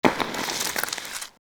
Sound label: Ice Sliding